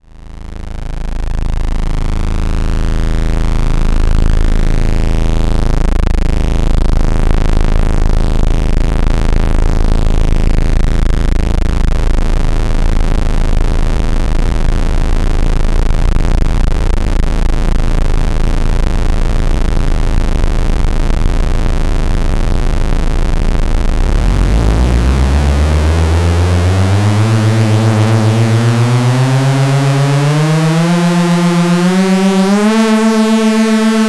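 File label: wave and saw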